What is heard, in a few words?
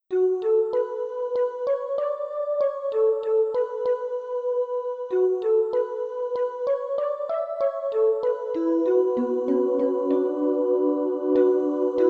MIDI